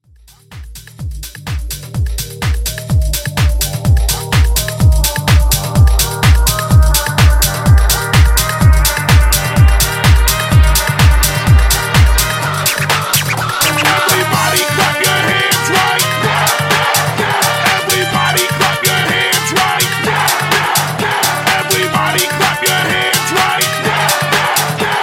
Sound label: 128 Bpm